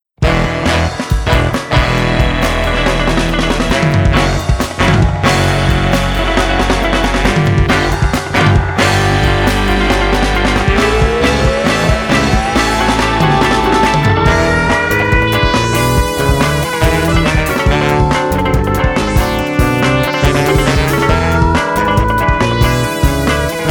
creep funk

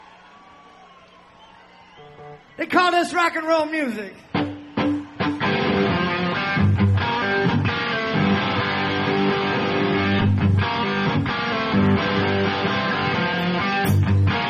jazz

